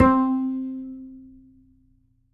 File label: Upright Acoustic Bass; 1ST POSITION; Larry Seyer